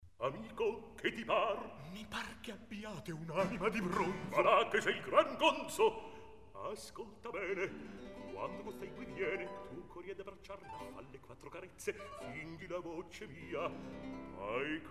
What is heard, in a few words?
Recitativo; Amico che ti par; Mozart, Wolfang Amadeus; Opéra - Don Giovanni